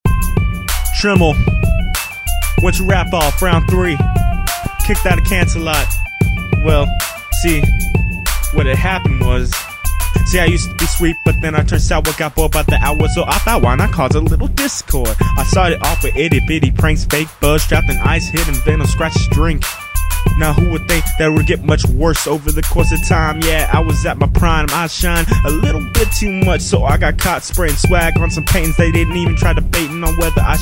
Rap

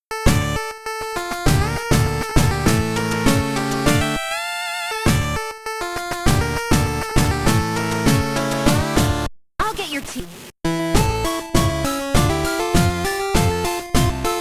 (Chiptune Cover)

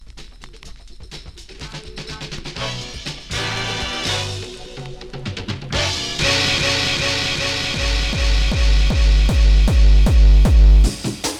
Techno

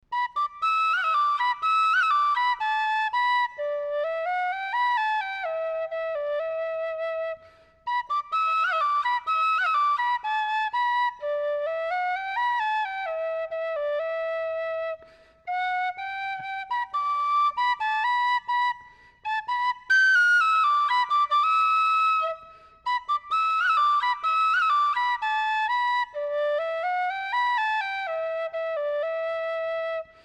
foggy dew tin whistle

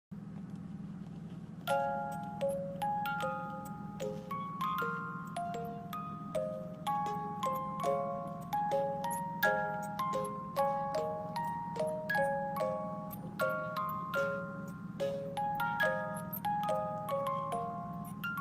Music Box Snippet

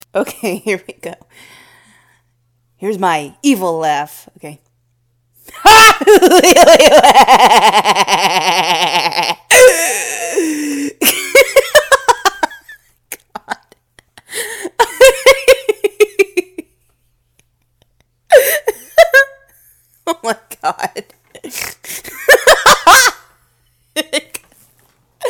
Evil Laugh

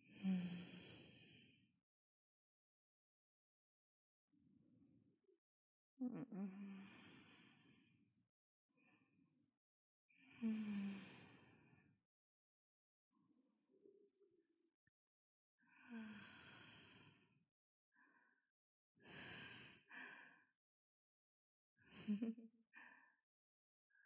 Simple Moans

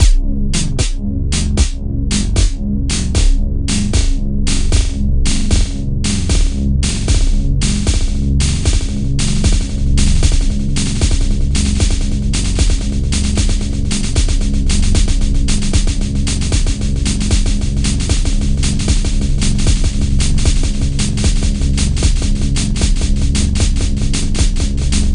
Loop Decay

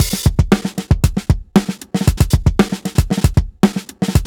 drum; Pony Fan Music Remix Stems

